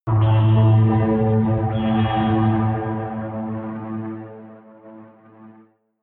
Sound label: synth chirp deep, drones